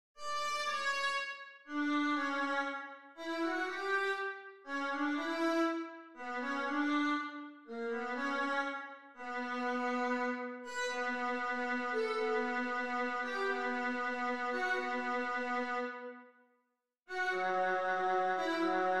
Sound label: viola melody; fourseasons summer